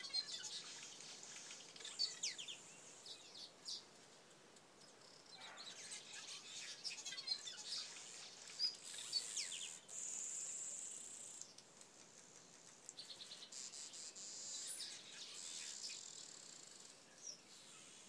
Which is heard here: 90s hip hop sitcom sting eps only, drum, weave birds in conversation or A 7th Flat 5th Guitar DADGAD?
weave birds in conversation